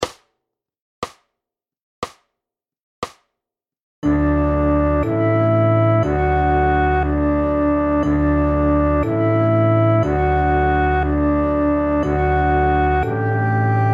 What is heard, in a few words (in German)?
Bruder jakob kontrabass